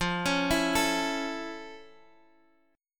F Augmented Major 7th Guitar Half Step Down, chord